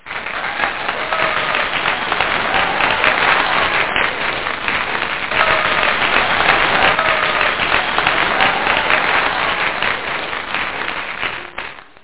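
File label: APPLAUSE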